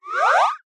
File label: cartoon slide whistle reversed chorus delay funny